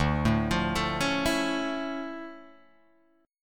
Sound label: D Minor Major 9th D down Guitar Open D